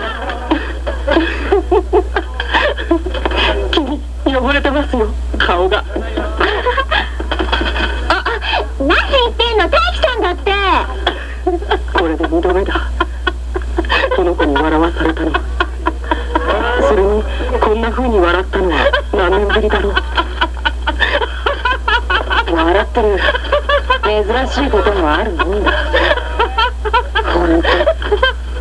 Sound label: laughter; Taiki laughs on the cooking show; Sailor Moon